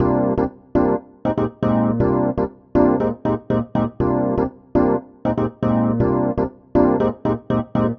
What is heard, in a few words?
Chord El Piano Cm, 120bpm; Zero-G; Extra Chord Progs